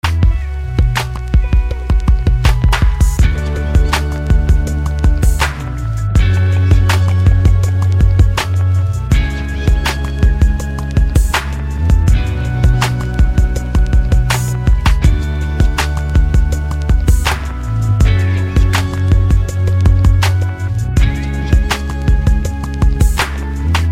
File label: instr